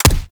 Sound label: Weapon Punch Rifle; Warframe; Weapons; Rifles